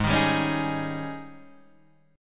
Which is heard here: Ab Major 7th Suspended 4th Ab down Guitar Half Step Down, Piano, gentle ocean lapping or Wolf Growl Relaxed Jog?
Ab Major 7th Suspended 4th Ab down Guitar Half Step Down